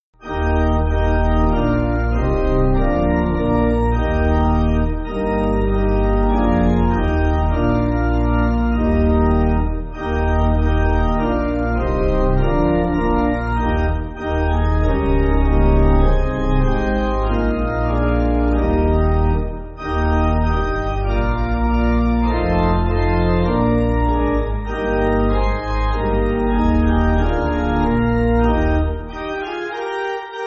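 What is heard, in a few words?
Pipe, Music Backing Files for Churches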